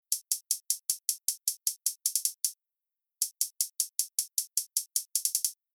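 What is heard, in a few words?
Reverb Delay hi-hat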